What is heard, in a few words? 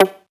Synth Cuts; Vengeance Samples; VES1 Instruments